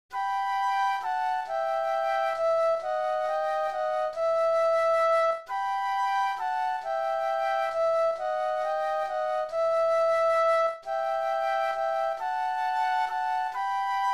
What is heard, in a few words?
Flute Duet; christmas duets